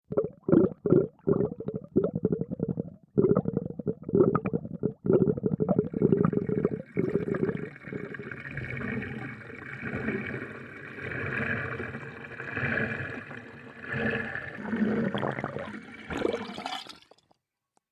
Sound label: underwater